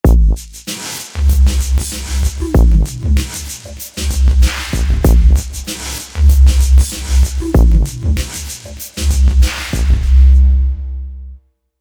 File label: Beat